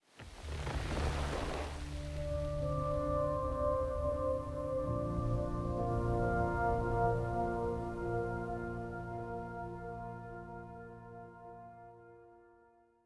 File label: Jingles